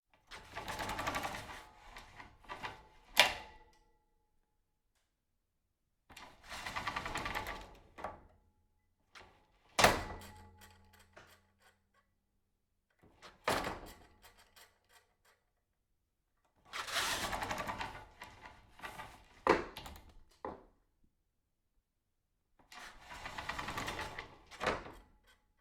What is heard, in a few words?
projector screen slow pull